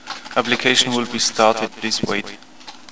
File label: start